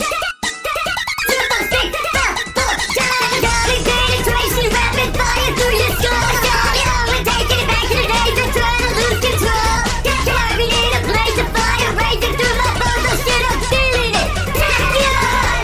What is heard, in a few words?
yay